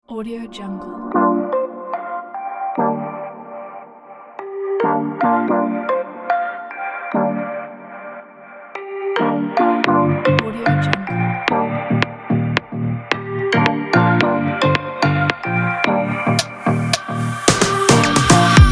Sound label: uplifting fashion future house dance